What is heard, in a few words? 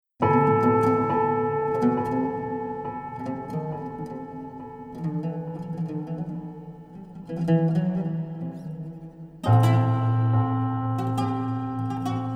HB and Oud